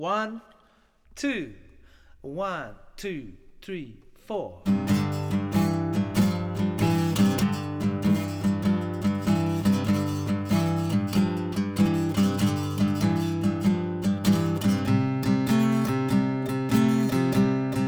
Guitar Playback

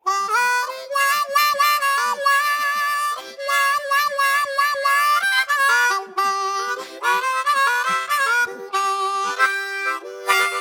The Sound Of The Blues Harp

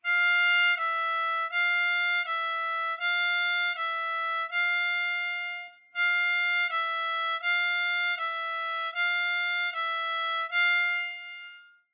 Single Note Exercise, Hole 5, Blues Harp

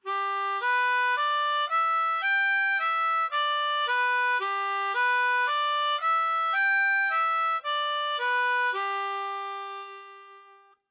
Cross Harp Exercise

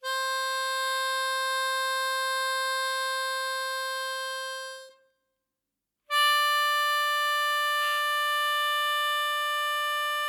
Single Notes W. Pucker, Blues Harp

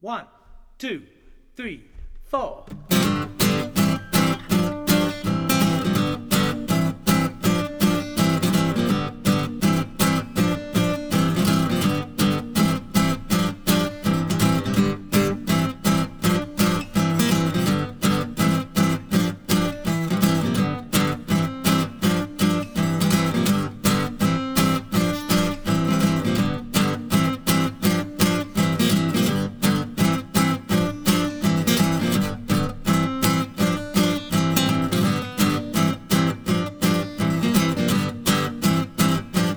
Guitar Playback

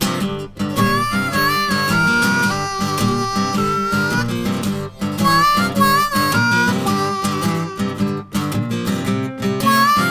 Bending Blues Exercise In G, Blues Harp

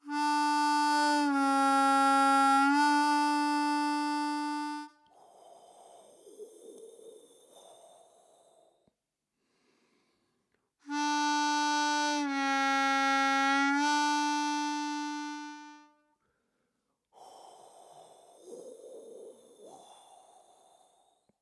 Bend In 1-Draw, With & Without Harp

